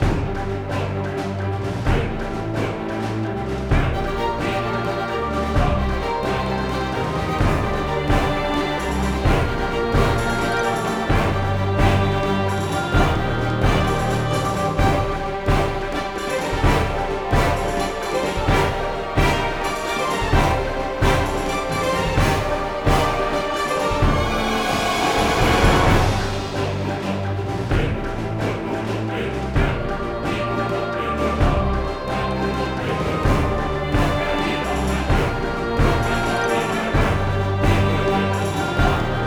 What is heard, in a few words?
EPIC Action